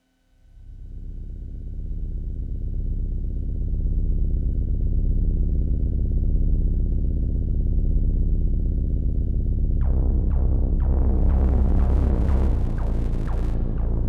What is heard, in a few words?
noise; drone